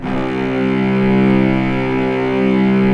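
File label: cellos C2, String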